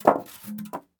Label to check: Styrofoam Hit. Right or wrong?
Right